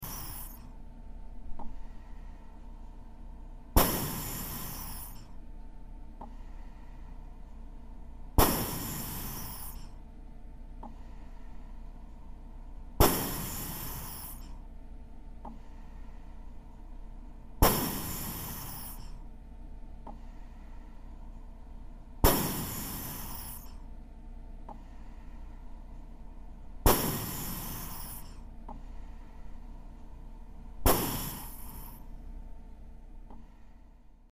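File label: Respirator Interior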